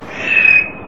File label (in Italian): fischio